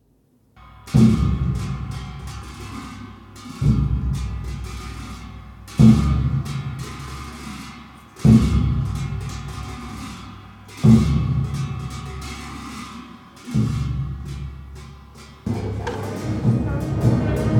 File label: Buddhist Monks, Ceremonial Horns and Cymbals; Maitri Vihar Monastery; Tibetan Mantras & Chants